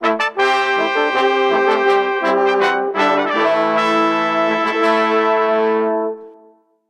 Fanfare; Sam & Max The Devil's Playhouse; Sammun-Mak Fanfare (Five)

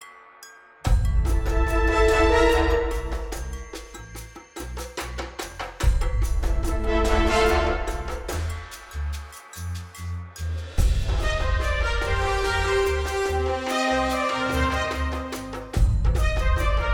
Enemy Ship Explore

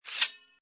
Enemy Collision; lego ninjago rise of the snakes

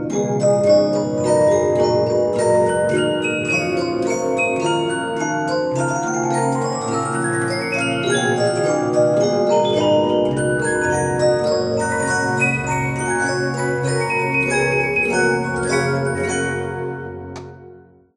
Music Box; Five Nights at Freddy's; Help Wanted; Music Box (Clean Loop)

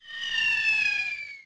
Canon Fire

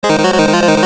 Boss BGM B Lv 2, soundtrack; TwinBee; arcade

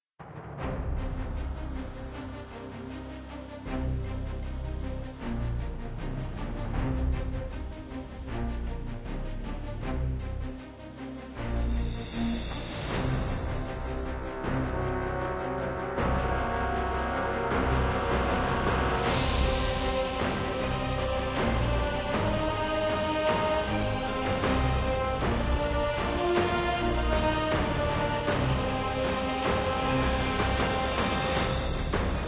enemy move; devils demons android game music

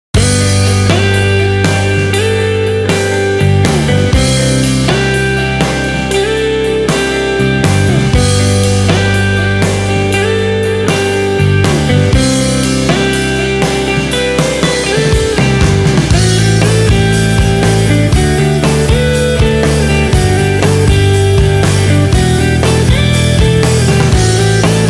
Chill Out; Star Records: Ride On Shooting Star

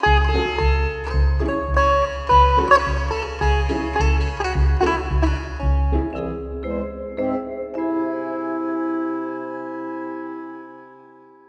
Bari Sax; simpsons hit run; ps2

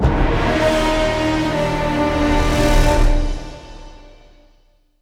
Intro Stinger; StormsMonsters Smasher

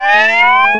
Light Sweep

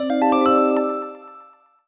jingle chime positive

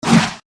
Transition Screen Up; Sacrifice Sanctuary Revamped

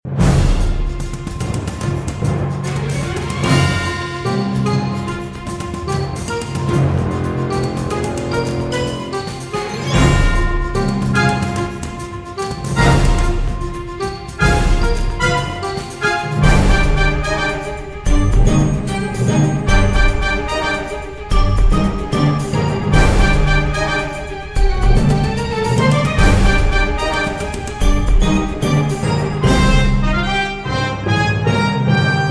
Music Aircraft; Jack Keane

